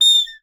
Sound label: bird call